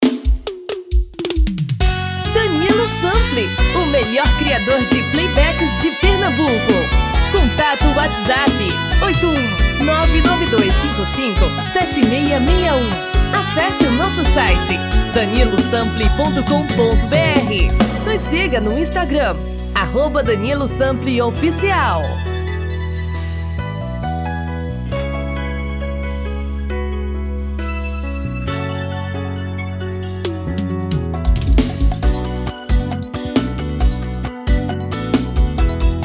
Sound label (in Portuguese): Brega tom masculino